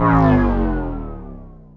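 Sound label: environmental shield phase off; Earth and Beyond; EA Games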